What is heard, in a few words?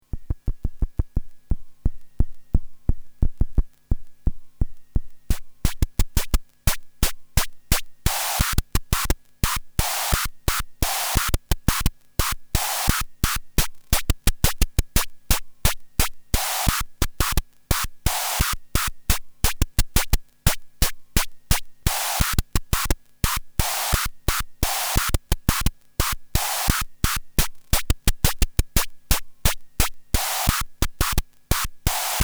noise beat